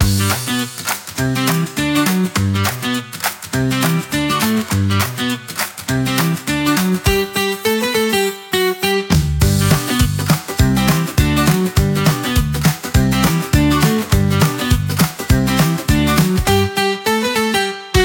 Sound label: 102 bpm Handclaps Guitar; 34 sec; ai social media music